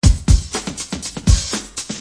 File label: 121 bpm shaker booty, drum loop